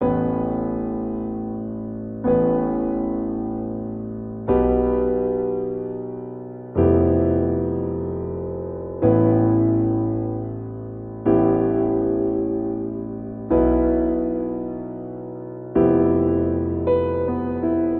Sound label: Ostinato Cinematic 6/8 A Minor 80 bpm, Grand Piano; MIDI Clips; Ableton